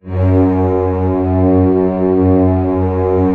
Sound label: ORC F#1 PP, Orchestra; ORCH C0-C2; Sound On Sound - Peter Siedleczek's Orchestra